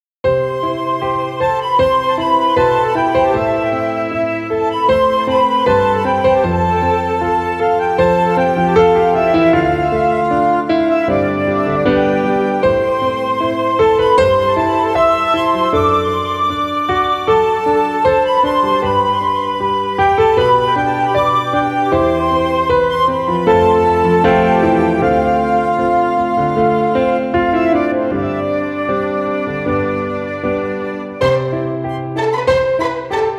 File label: Legato staccato